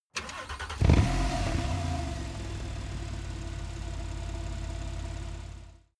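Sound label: sports car exterior engine start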